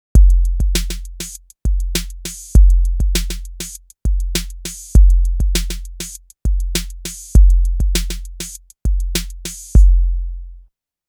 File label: drumstation classic tr808; novation drumstation; 808 shootout